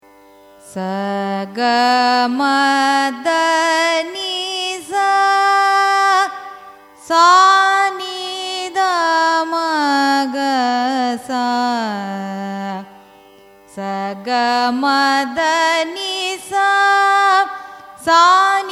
raga sunadavinodini arohanam avarohanam, carnatic music